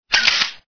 camera click; GFive; Blade-X-F600

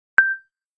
Beep, phonetones Nokia N900

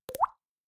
Plop, notifications; Yota Yotaphone-2

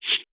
light open, slider tones; Motorola ROKR-Z6

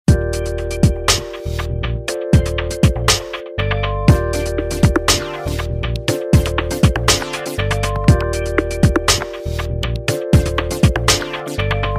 Hiphop, ringtones

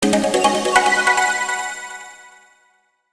Space email alert; Nokia N79; Audio themes

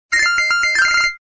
Shuffle Tone; Samsung SGH-i560

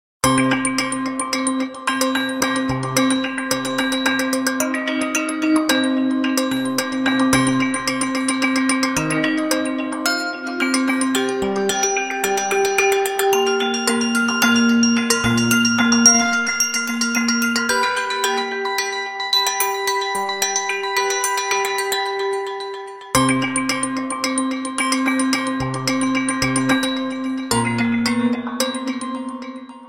3D Wind Bell, ringtones; SKY IM-A770K-VEGA-Racer

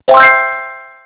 retry success, Sony Ericsson; Z530i

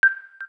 KEY XYLOPHONE HASH, keytone; Samsung SGH-T369 Linx

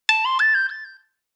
slider; HTC EVO 4G LTE

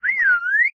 Whistle

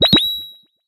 Spectrum, notifications

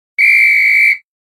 Whistle

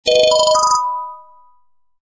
Basic tone, Samsung; SGH-A847 Rugby II

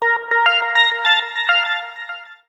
Synth Bell; Samsung Z3

